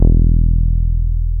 BAS MKS-50; Best Service Gigapack 2

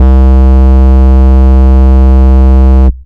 menacing bass; Jungle

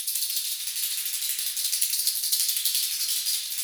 metal jangle, perc fx; Roland LCDP14 Africa VOL-2

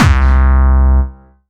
Distortion Kicks, Bassdrums

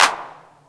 CLAP; CLAPS; USB Soundscan vol.20; Fresh Disco House I; AKAI

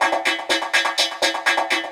Echo Chuff, Synthetic Pulses

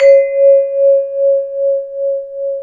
VIBRAPHON; ILIO

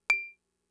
Clave, Fricke MFB-522

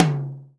TOM; Best Service Gigapack 2

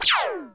RICHO ZAP

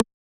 Side Stick, Yamaha DJX 2B

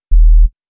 Super Sub Bass; Sonic Foundry; Cinematic Funk Urban Tone Poems; Master Zorn

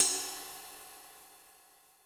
Sizzl Rck, Cymbal, Yamaha DTXpress

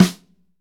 SNR, Roland; Northstar - Drumscapes Roland; Dry

